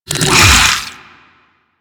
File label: Infested Big Ashen Melee Swing; Warframe